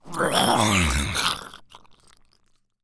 zombie carrier death

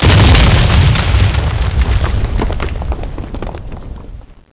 Fire Ball

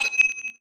ultimate beep; Warcraft 3

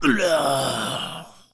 zombie male death

